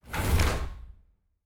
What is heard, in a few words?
Door, Sci-Fi Sounds